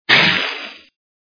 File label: zombie attack up